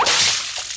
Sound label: bubble pops and splashes